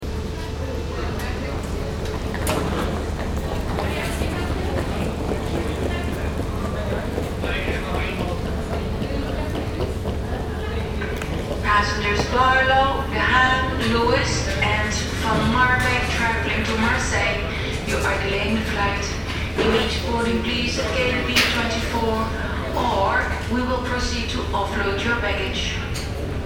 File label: Aircraft Noise